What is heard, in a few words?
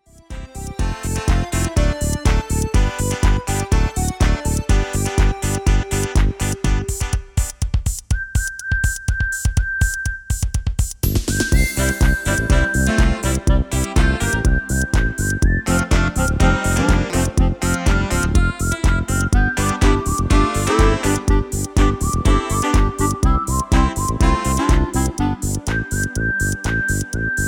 MIDI